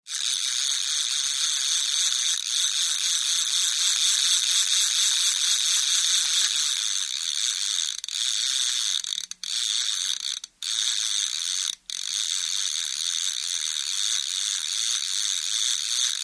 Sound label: Fish Reel Cast Reel In, fishing